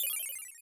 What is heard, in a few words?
Twinkle; snes; super troll islands